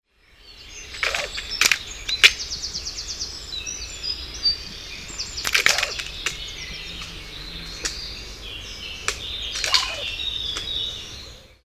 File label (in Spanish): ARDILLA ALARMADA